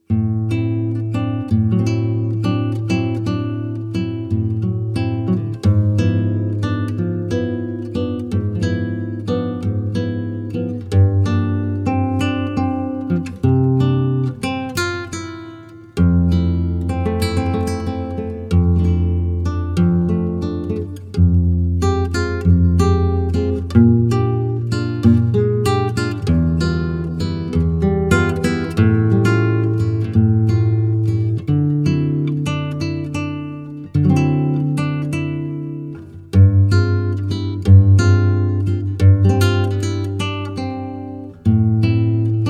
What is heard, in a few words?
Solo Guitar, Flamenco